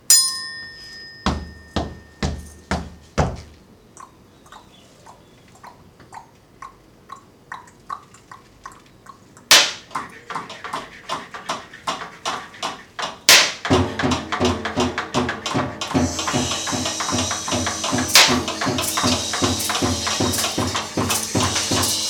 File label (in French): Paysage Sonore Kitchen